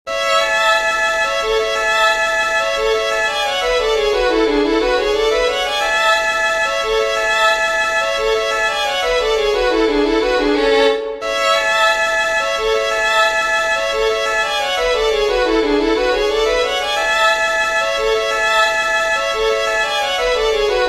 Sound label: REEL SAINT ANTOINE with Harmony, fiddlers; southern kings fiddlers